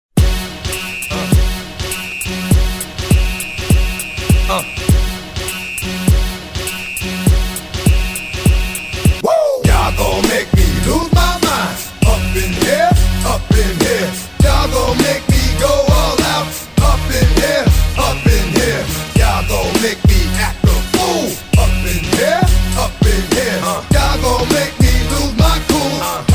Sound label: HIPHOP SOLO